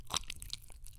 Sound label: Slimed